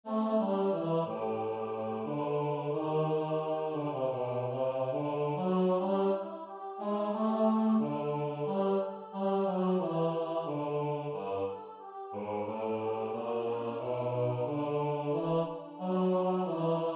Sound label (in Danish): bas